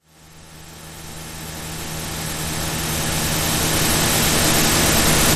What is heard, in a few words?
Microwave Build Up